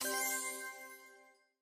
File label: coin